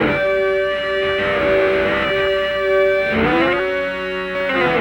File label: Eee Ooh 5th, Electric Guitar; Sonic Foundry (Sony Creative Software) - Friendly Fire